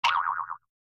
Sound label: incorrect; ktown